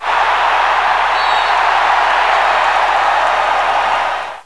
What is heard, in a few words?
CHEERS